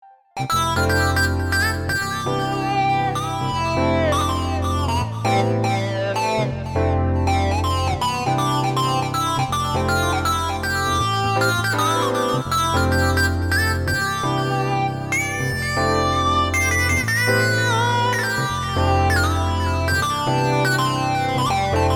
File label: OSC Sync; dexibell vivo s2